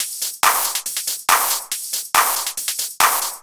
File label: Loop 140 BPM; vengeance essential club sounds-3